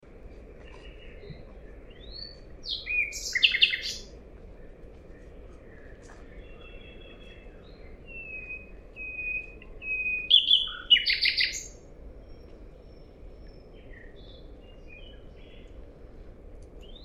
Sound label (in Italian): Usignolo Milano Parco delle Cave